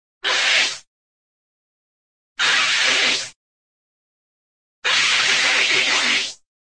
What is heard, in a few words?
sci fi snake ray; cartoon